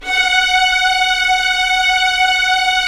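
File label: Strings Violins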